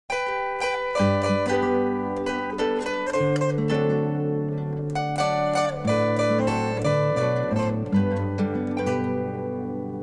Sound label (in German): Volksmusik